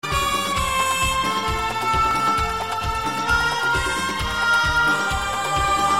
Harmonica

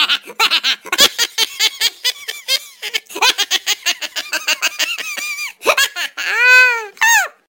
Laughing Cat

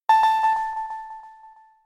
DS Startup

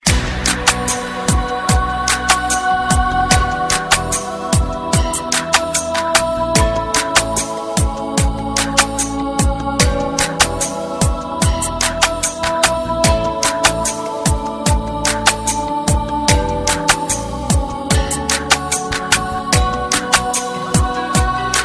Intstrumental

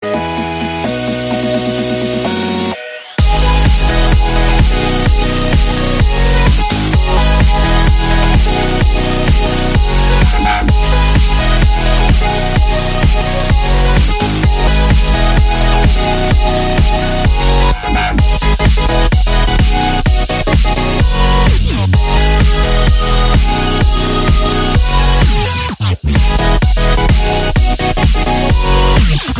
EDM

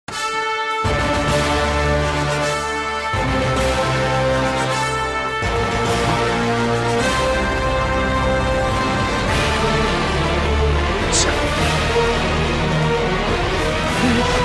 Police Theme; Pokkiri

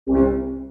Money Pickup; GTA 4

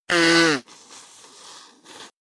Blow Nose

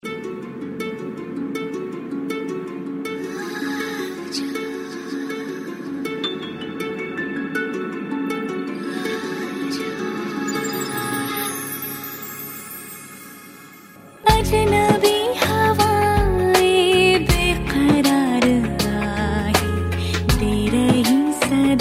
Horror

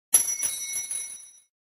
checkpoint chime

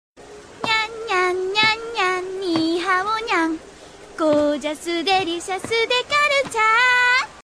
Song

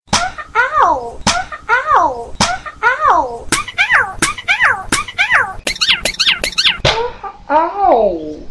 Smack Ow

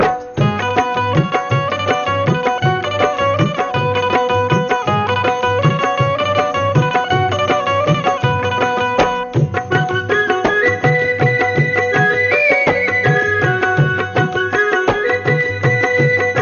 Sweet Music

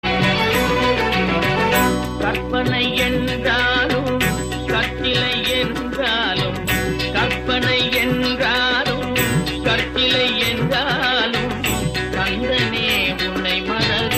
Tamil Devotional

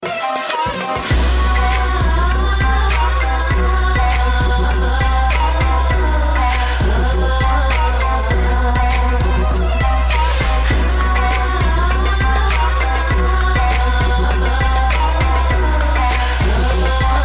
Trap Oriental

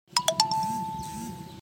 xylophone

